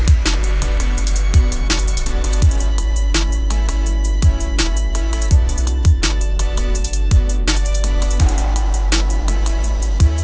A catchy trap beat with EDM Synthesizers in the mix, creating a unique electronic sound